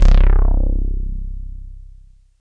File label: Roland Juno-106, synths; OP-Z